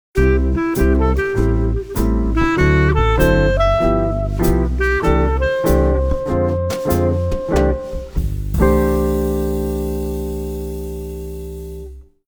Jazz videoringtone; Nokia; N78; Audio themes

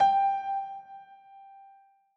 dial tone piano; Oppo; Neo 5; ui

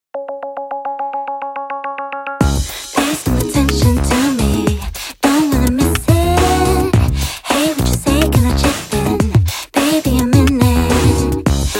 Jig, Nokia Tones; Windows Phone apps

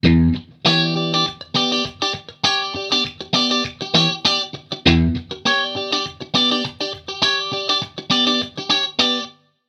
Funk, ringtones; Marshall; London KB 1501

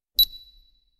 Video Record, ui; Oppo; Find X903

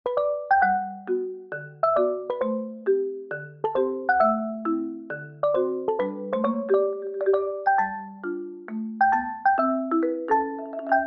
Morning Xylophone, ringtones; Samsung; Galaxy A56

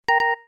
TA Disconnect; L706ie; LG

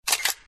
Camera shutter end; Alcatel OT-995

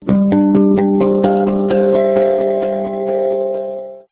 Startup; Pantech PN-215

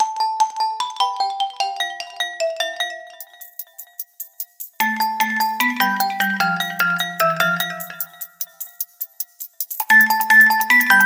Melody; Coolpad 5860

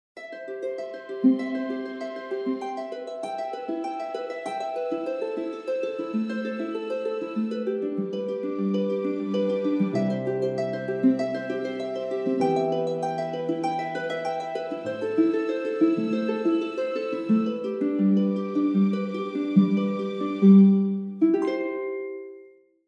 Harp, alarms; Tinno ATT Calypso

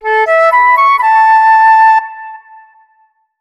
Naturals Flute; X1; Sony Ericsson; Meadow

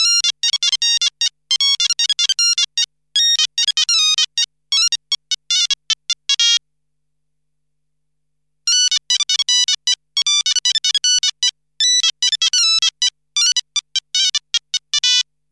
Hurdy gurdy staccato, Nokia, Monophonic; Noa Nakai

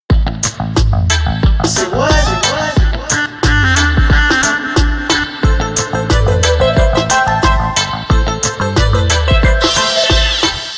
Ragga; Sony Ericsson; U10 Aino

